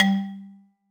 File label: marimba; ゆめ2っき